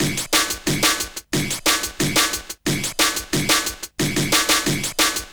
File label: distorto, drum & bass beats & breaks; Fluid Dynamics